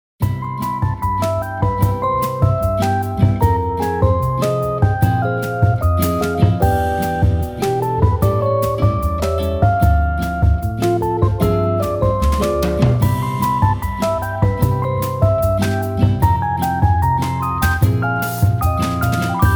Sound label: Bossa Nova; Gionee; S10